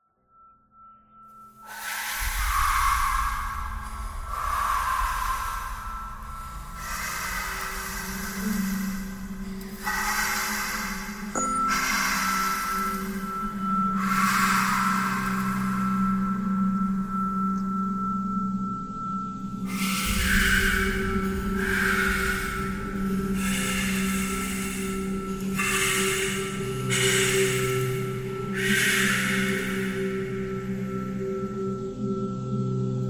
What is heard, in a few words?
breathing thread noise